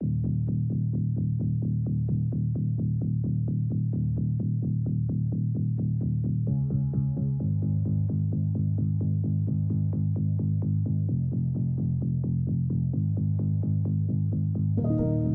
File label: Lo-Fi